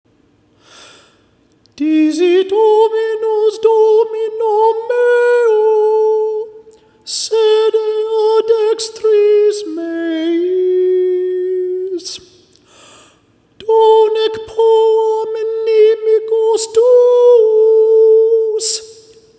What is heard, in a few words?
preludium chant unison